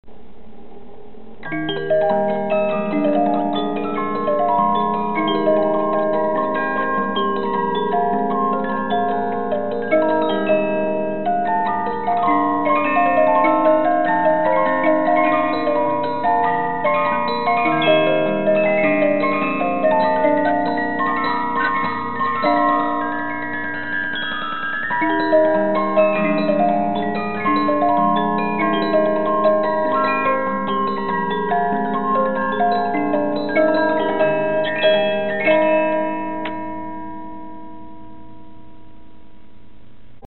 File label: musicbox